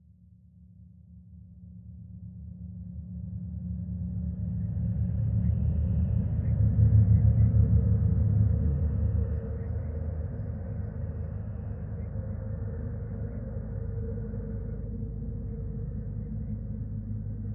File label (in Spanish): Gran bajo Ruido Reverb